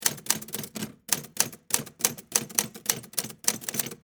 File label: Typewriter Type, Technology, Typewriter, Smith, Corona, Type; Typing Sound Effects; BlastWave